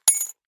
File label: key ring small drop parquet